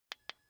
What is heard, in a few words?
car key button click signal